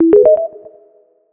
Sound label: Charging Started Fast; Samsung Galaxy M31